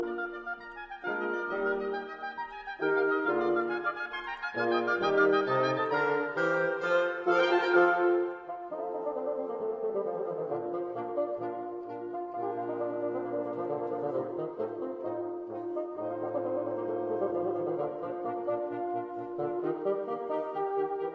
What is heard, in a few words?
Octet Allegro spiritoso; Octet No 2 in E flat major